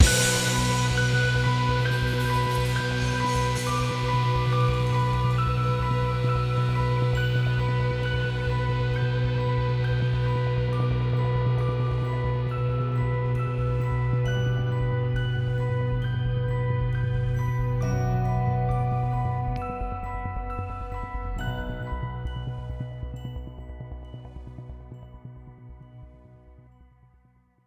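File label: Music Outro 135bpm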